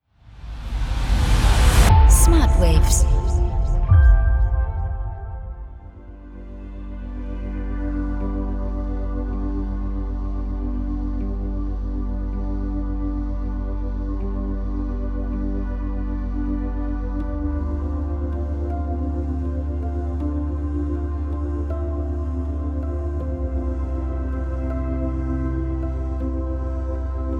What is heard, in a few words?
Pure Harmony